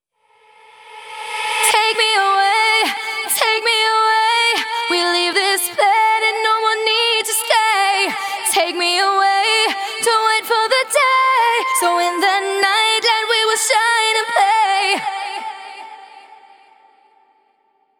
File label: Female Vocals Chorus WET, 140 BPM; Vengeance Samples; VTDS2 Song Kits; Female Take Me Away